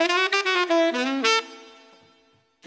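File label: Cheeky Triplet 4beat D major 90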